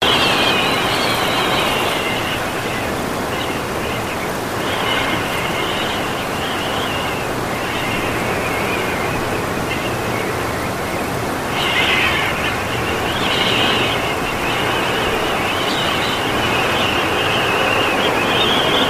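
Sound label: Wind Interior Squeal Door Emitter Loop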